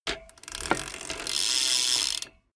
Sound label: bmx bike pedal once freewheel brake